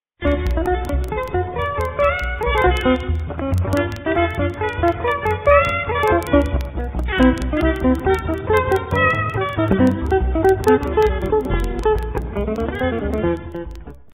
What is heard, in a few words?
dixie